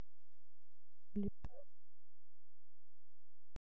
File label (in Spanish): gota